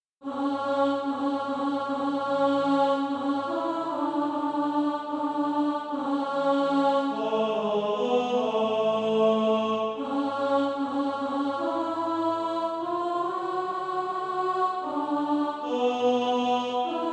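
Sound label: alto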